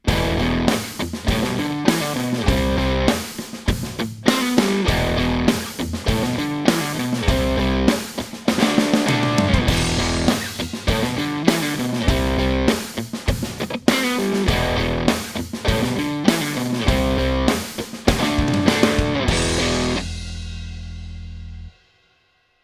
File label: front rhy, KSM Ribbon; shure